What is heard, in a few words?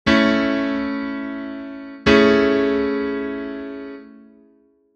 A2 Amaj7